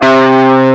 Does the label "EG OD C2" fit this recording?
Yes